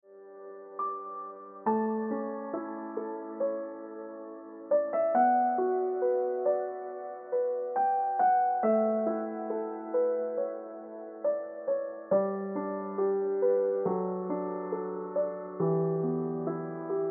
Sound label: Ringtone Piano Music